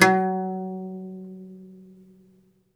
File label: OUD F#2, Plucked Strings; E-MU Producer Series Vol. 7 – Old World Instruments